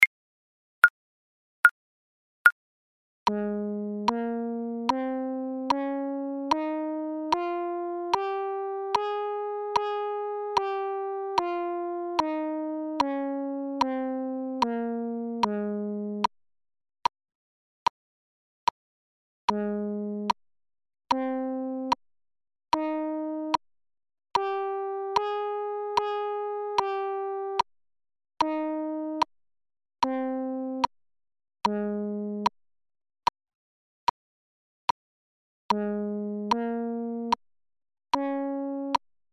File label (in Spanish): Entonacion Intervalos Escala Mayor